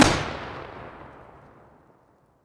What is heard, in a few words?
m320 muzzle mono distant, weapons